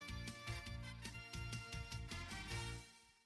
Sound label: short door